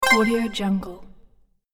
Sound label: powerup game